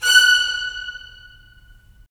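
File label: strings violins; Roland - LA Composer vol. 4; 90 s Sample CDs; Violins Sh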